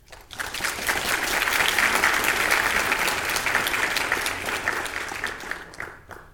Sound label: clapping short